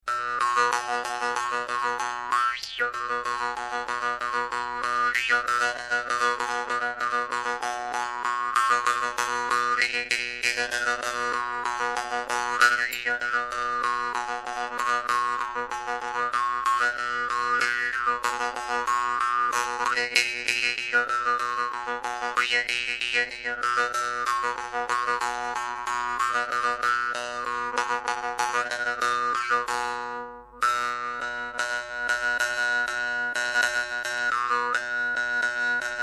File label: India brass, morsing brass; vargan; uzkij